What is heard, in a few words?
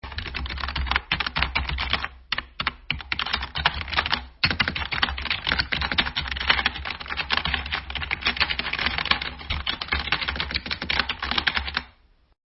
keyboard effect